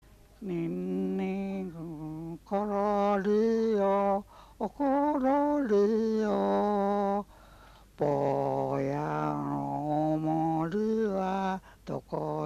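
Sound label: lullaby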